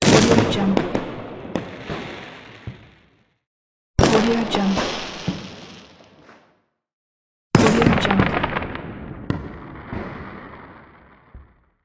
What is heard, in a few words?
fireworks crackle